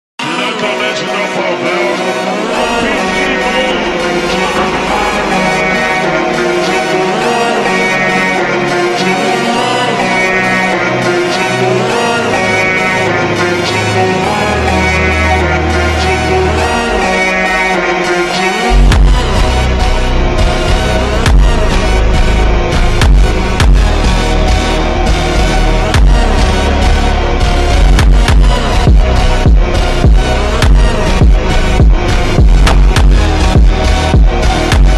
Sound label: funk slowed reverb brazilian phonk